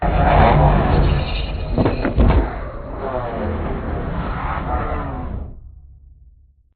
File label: strafe far; Beacon; Titanfall 2